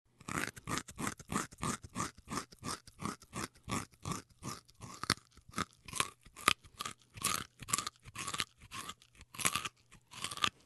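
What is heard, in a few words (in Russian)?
хруст еды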